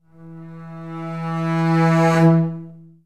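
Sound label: basses crescendo E2; Best Service; Peter Siedlaczek's Advanced Orchestra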